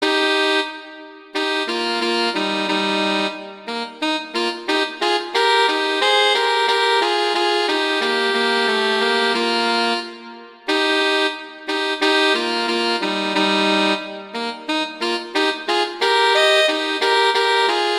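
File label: Duet, horn; Mozart; Duets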